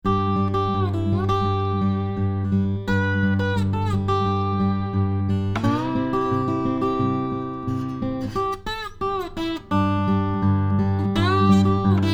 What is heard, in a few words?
MXL R40 Transformer; Edcor